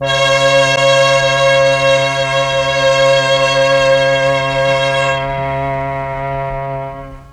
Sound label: MEL STR, Legendary M400